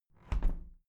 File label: window plastic squeak close soft